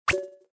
otpravka sms

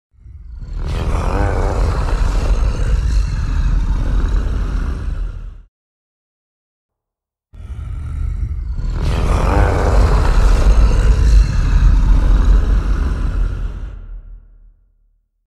tihiy ryk halka

